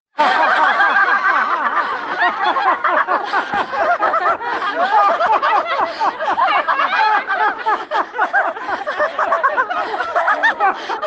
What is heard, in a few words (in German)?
Lachyoga